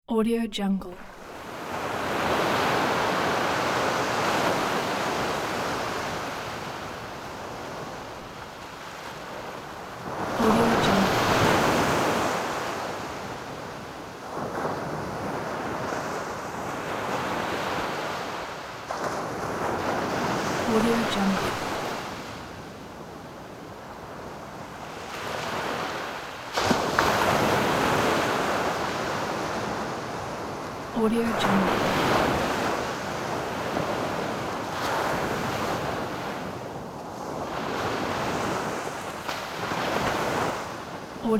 large sea waves

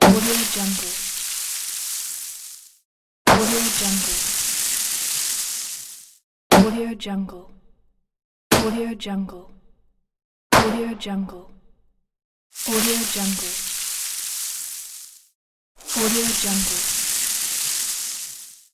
confetti gun

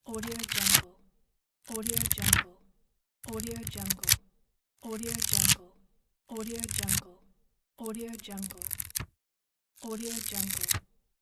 the flipping page; style life

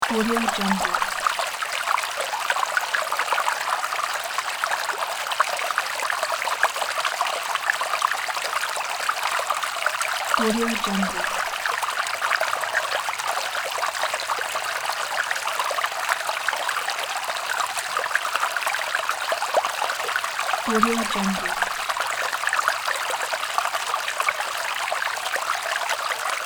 stream

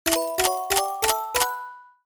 slot machine stop

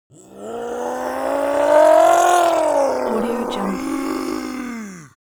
creature zombie human change; smartsoundfx

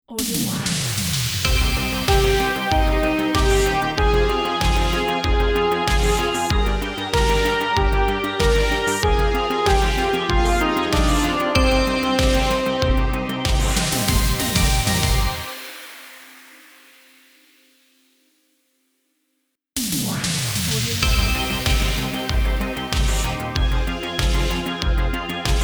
nightwave logo; drtimwaters

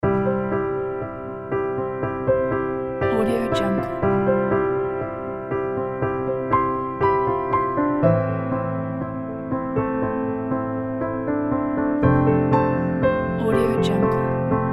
sentimental piano and strings advertising

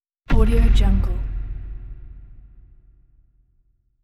dramatic impact with pulse

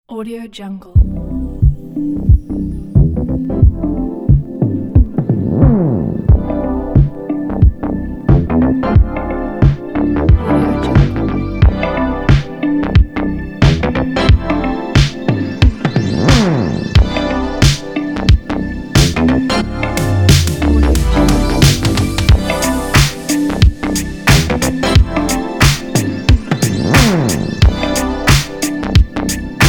soul future funk disco; orangetreesounds

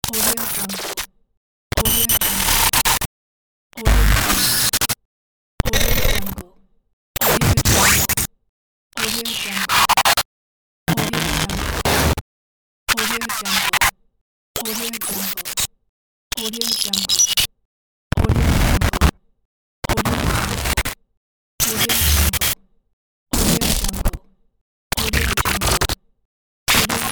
glitch; mega pack; umcsound

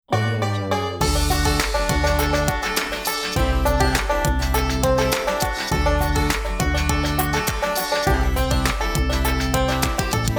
funky bluegrass logo; drtimwaters

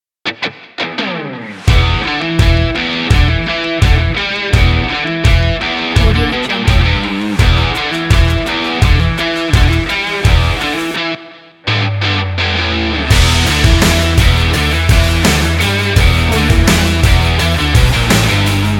energetic sport rock

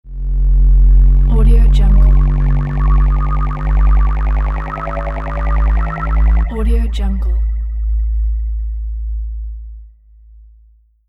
vowel noise

